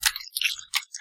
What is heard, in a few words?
nether extract blood; nether-pack